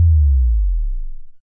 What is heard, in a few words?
nice solid sub, Basses